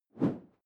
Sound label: whoosh simple short airy; swooshes-swishes